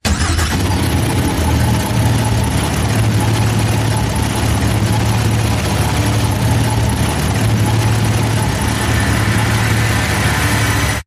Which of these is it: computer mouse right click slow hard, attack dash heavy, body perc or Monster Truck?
Monster Truck